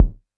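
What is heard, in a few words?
vermona drm1 mk3 bass drum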